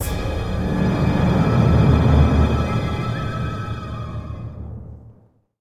Saloon Stinger

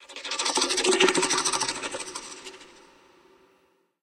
Common Game Over; Inotia 3

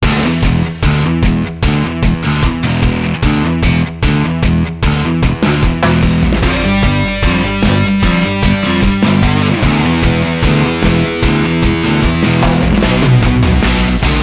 BGM Event Battle; Kenka Banchou 6 Soul Blood; 3DS

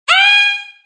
Scream

